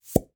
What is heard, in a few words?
Plunger Pop